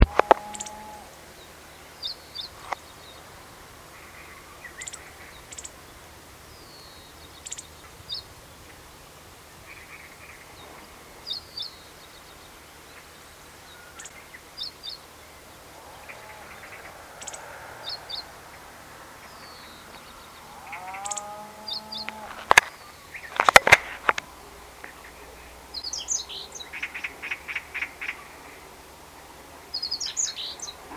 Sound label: meadow bunting